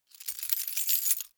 key ring large rattle hard; objekte; schluessel

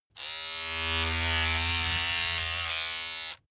razor on load short close; soundpool

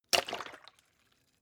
bottle water upend, gastronomie; berufe

